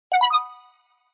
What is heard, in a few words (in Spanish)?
Cortos de Notificación